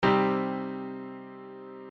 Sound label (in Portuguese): Acorde Menor